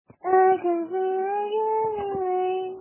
rain

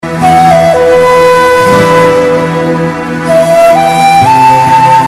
sad music; naruto

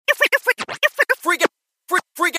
scratching

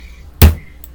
punch the table

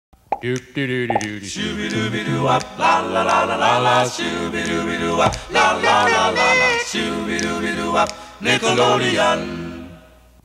bumper; nickelodeon

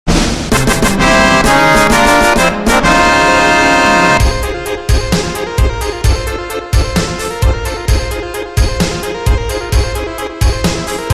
victory fanfare

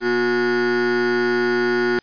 foghorn; the price is right

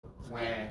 whaa

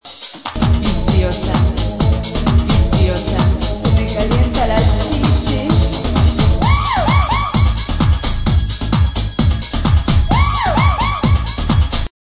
zzzzzzzzzz

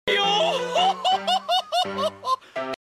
yohohoho

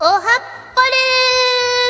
polka

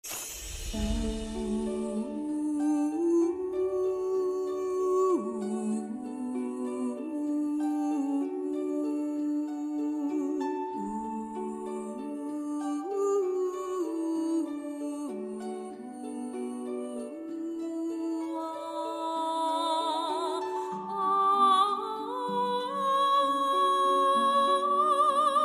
sing along; fortnite emote

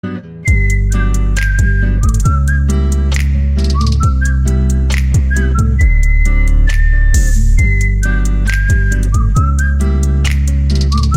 beats

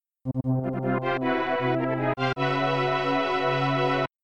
jingle; bowser; sound journal

